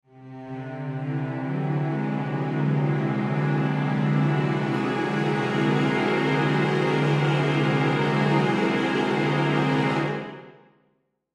Strings Crescendo, Strings Crescendo Mysterious; Musical; Blastwave

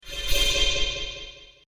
The Light Fantastic, magic sound; Fantasy sound effect; role playing games, magic videogames

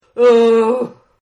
Groan; Groaning; Voice; Partners In Rhyme

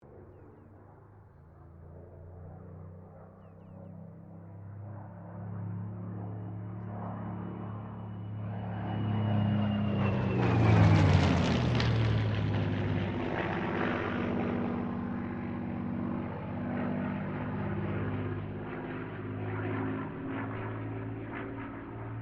AIRCRAFT SUPERMARINE SPITFIRE MK-XVI PASS

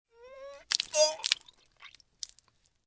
Throwing Up Human Vomit On Cement; Vomiting

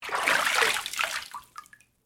Water Movement Tub, Water, Movement, Large, Tub; Nature; BlastWave

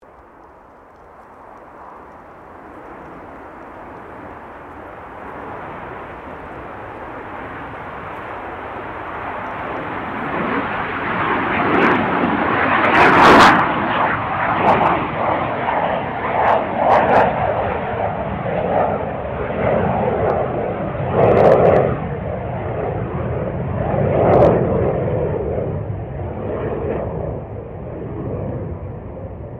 AIRCRAFT GLOSTER METEOR F MK8 TAKEOFF; Gloster Meteor figter jet; Airplane; Vehicles; Airplanes; Aircraft Collection; Twistedtracks

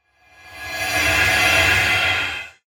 Dark Reverse High Pitch Scream; Blastwave; Evil Sound Effects

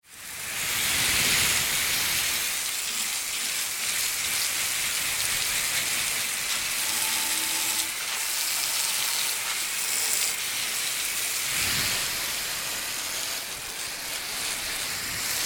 Hose Spraying Foliage and Various Surfaces; Spraying garden plants and areas

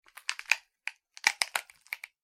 horror small bones cut, Bones Being Cut/Broken; Injuries; Combat; McKinneySound

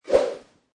Big Swish Whoosh, Something zooms by; Rope swish, cable swoosh or Karate arm swish or leg swish

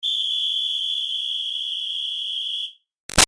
Whistle Sports Hard Blow Long; Miscellaneous Sports; Big Room Sound

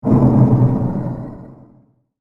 Mars Impact

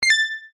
UI Confirm, User interface feedback sounds; Computers (Sound Effects:Electronics); SoundEffects-Foundation4

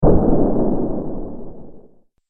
Dinosaur Mighty Footstep

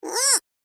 Gremlin Problem Imp, monster; horror

